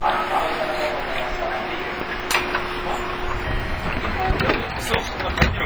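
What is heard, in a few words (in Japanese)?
ドア閉め, 221系 通常車, ドアチャイム未設置車両; JR西日本発足時に製造。京阪神間新快速のレベルを引き上げた。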